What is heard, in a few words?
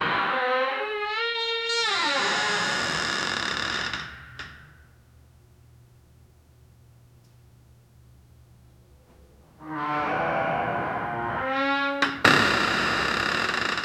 ambient sound in the bunker